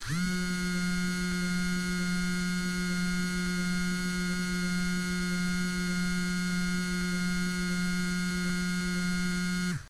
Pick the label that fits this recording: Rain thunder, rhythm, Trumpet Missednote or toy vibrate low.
toy vibrate low